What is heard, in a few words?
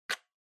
PC Hardware Earphones Unplug